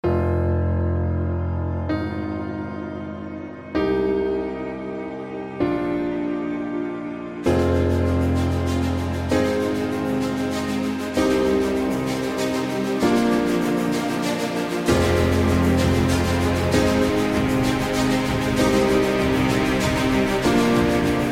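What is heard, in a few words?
Monumental, epic cinematic trailer music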